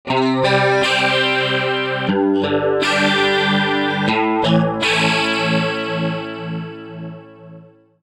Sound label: TC Chorus Delay Chorus Only